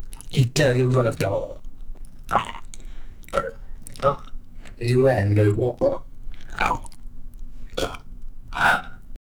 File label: a man eats walnut; eating